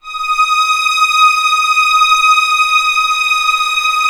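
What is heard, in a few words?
STRINGS D#5 FF, Orchestra; Sound On Sound - Peter Siedleczek's Orchestra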